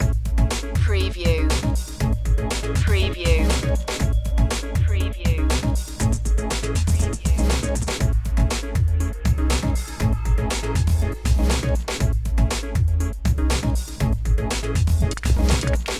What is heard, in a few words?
groove loop